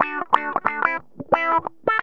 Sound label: GTR, Funky; Zero-G; Funky Elements